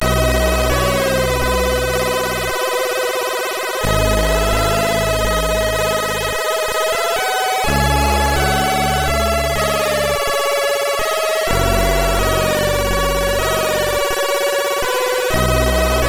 MOS6581; MOS6581R4

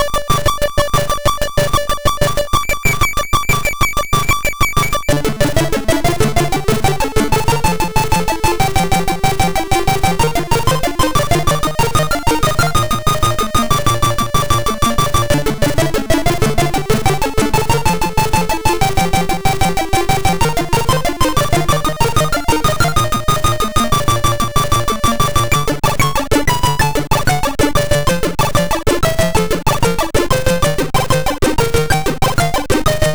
Intro sid MOS6581R4

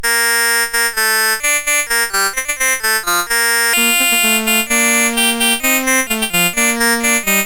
Allemande, CSG8580R5

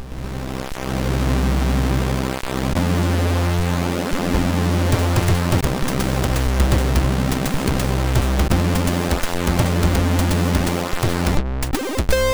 sid MOS6581R2; Mega9man

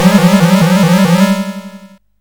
sid CSG8580R5, GAMES; Cavelon

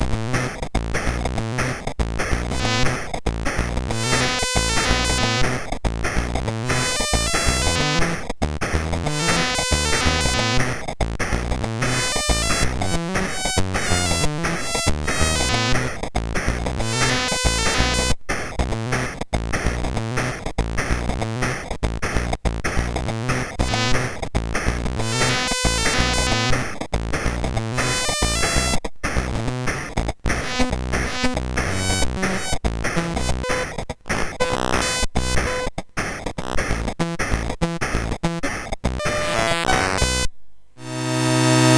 Music Demo, MOS6581; sid; Anon Music Demo BASIC

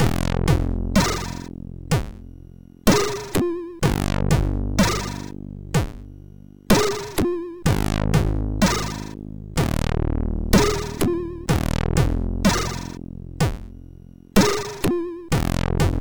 Little Game Tune; MOS6581R3

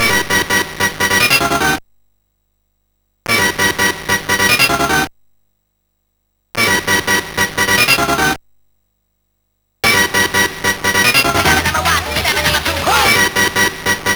sid MOS6581R3; Miracle 8 intro

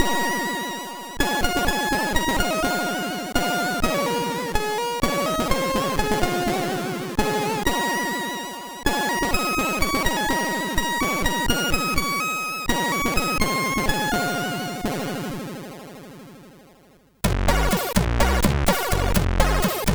Boessa Nova sid MOS6581R3

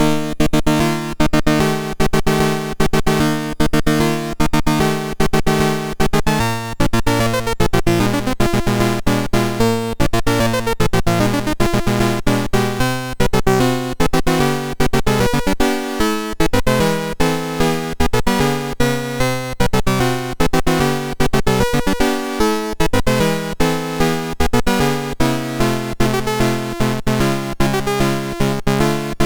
Fanfare, MOS6581R3

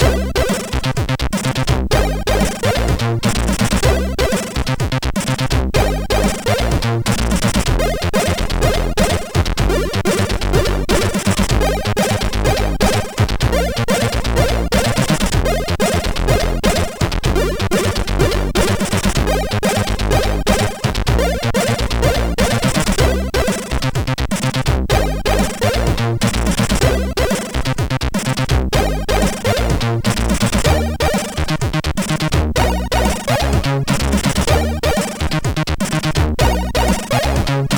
Intro sid MOS6581R4; hvsc

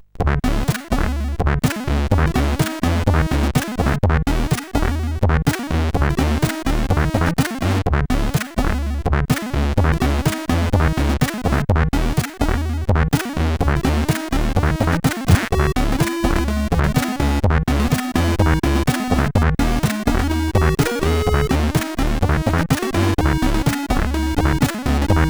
sid, CSG8580; hvsc

